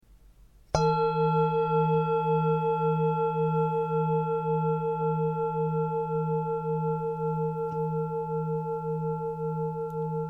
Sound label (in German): Klangschale